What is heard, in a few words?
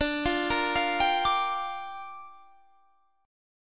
Bb 6th D